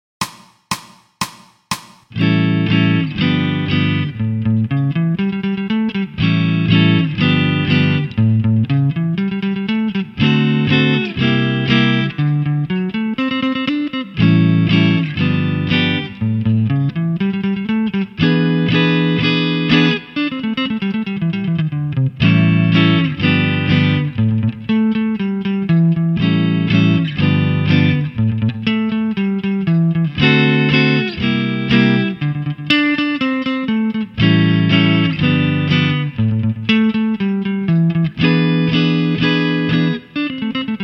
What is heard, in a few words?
Technical Study, electric guitar; electric guitar performance diploma